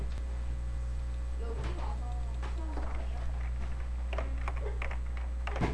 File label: wheeze